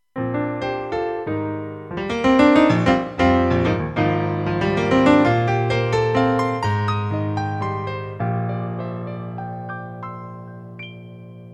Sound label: Piano